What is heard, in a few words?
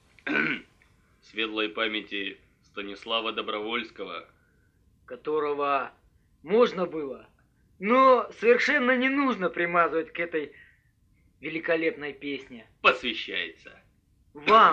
unplugged